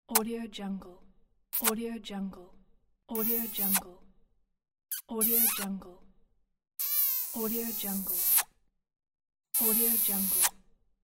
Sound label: kiss